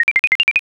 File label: processing